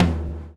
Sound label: BLW FILL 4 L